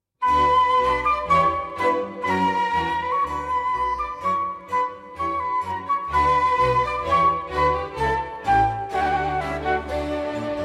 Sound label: Orchestral Suite in B minor - Polonaise; BWV 1067